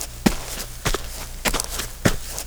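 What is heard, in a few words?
DIRT, FOOTSTEP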